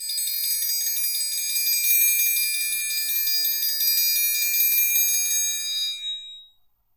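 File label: triangle tremolo, Synclavier Percussion; ILIO; Synclavier Percussion 2; World & Orchestral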